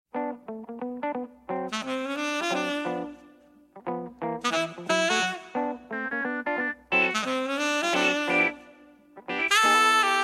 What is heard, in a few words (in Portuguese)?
Batida Diferente